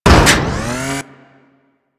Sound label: Injection Arm Mover Start; Ambience; Warframe; Grineer Ocean; Ocean Sabotage Injection